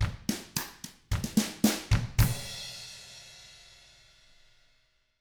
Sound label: COUNTRY, Drums; ILIO; Double Platinum Drums 1